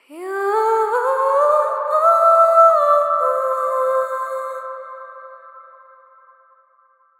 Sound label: Vocal Swell